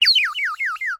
Bird whistle, clay, painted, water, airy, wispy, burst, strong, long; Eiravaein Works; Vaeyan IV